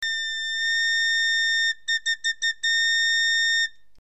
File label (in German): orgel